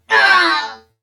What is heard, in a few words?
death